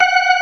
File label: ORGAN ALL STOPS; Keith Emerson Signature Series